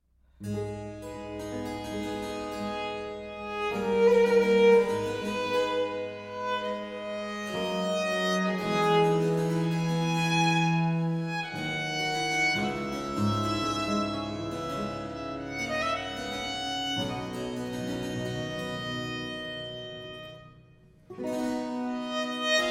sonata in a minor

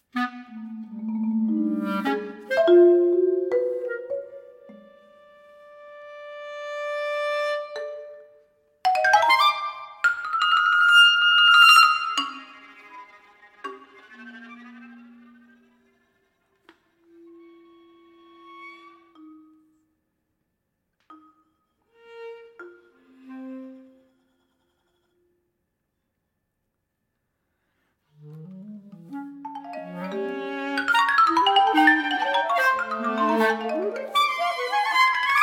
trio; fluido

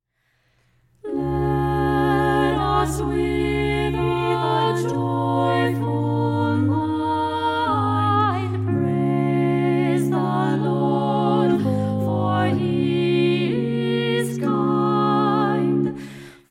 hymn; Let us with a joyful mind

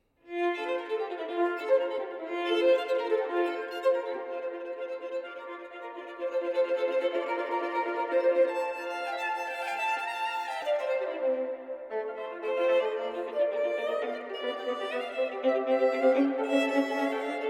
sonata in F major allegro, suonate a 2 violini; Vivaldi; da camera da suonarsi anche senza il basso

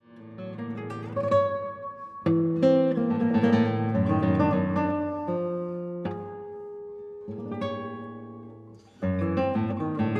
solo guitar

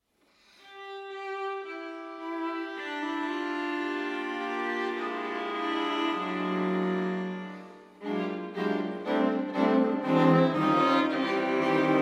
string quartet, allungherese

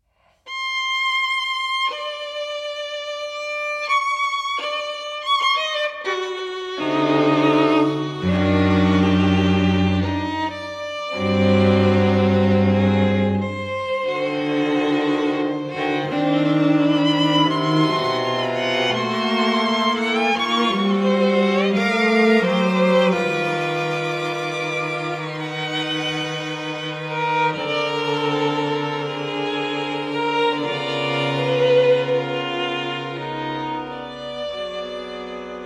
string quartet lento

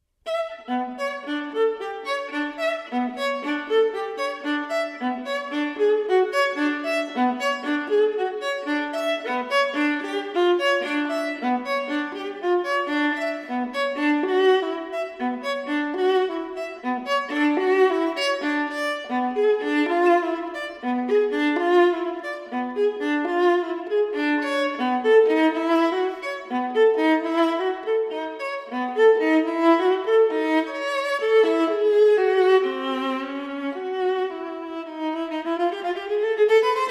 sonata song for viola solo; Armenian Music Project; Armenian music new and rediscovered

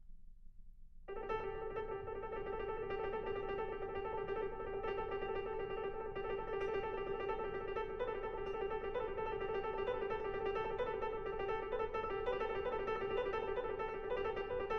etudes pour piano; der zauberlehrling

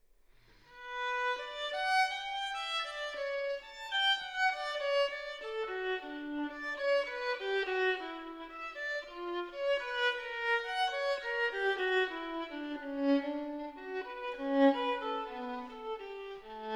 violin partita in b minor double; violin partita no 1; bwv 1002

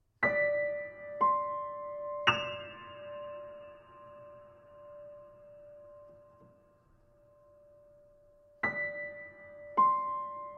solo piano

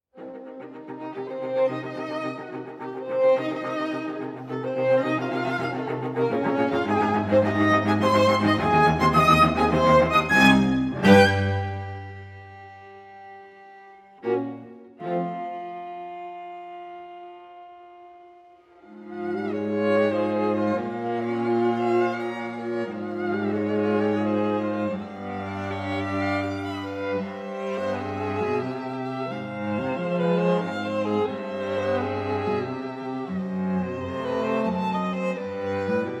string quartet in c minor allegro

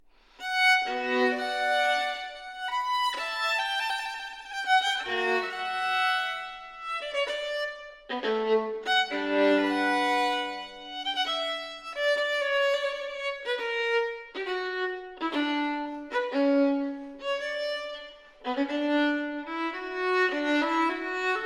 violin partita in b minor allemanda; violin partita no. 1; bwv 1002 i

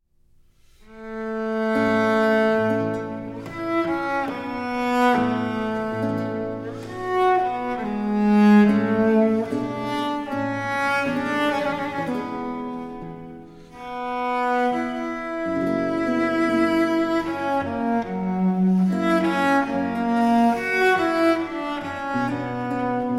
concerto for cello in f major largo

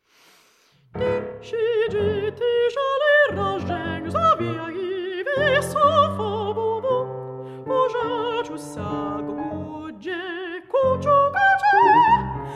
phonemena with piano

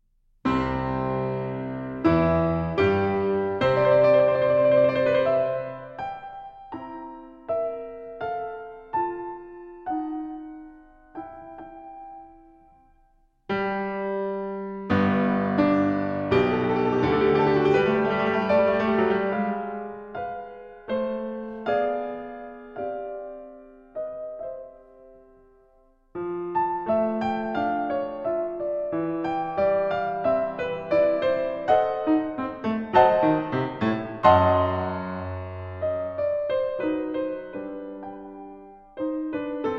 fantasy and fugue in c major

